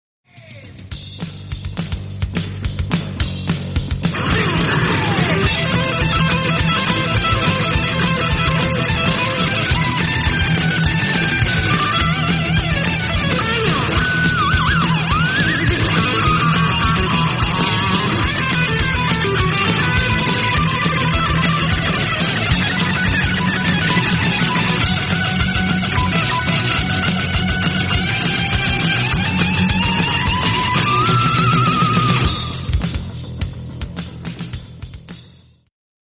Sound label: guitar solo